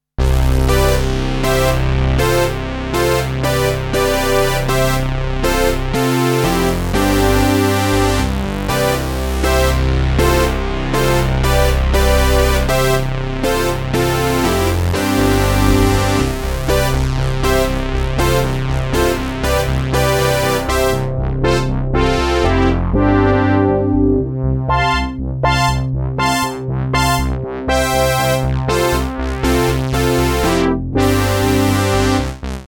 Poly Brass

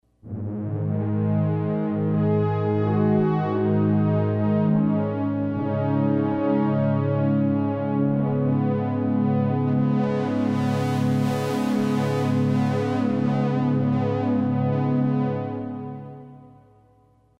XPandro Pad; Kris Rhen Presets

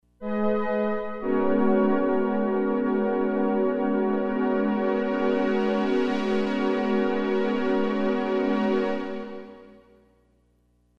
Pulse Strings; Kris Rhen Presets

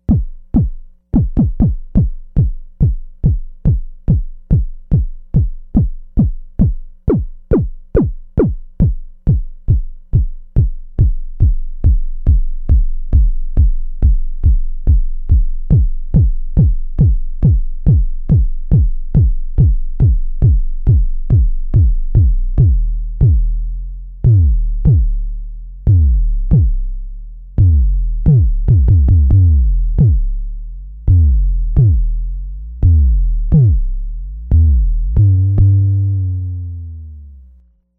Kick Me

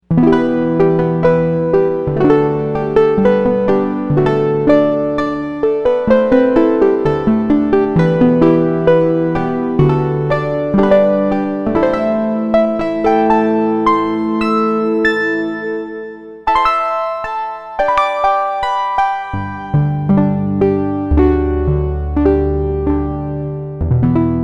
HARP; Ye Dragons Beer

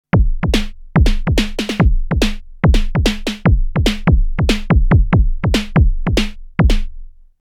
Techno Kick Jomox Snare

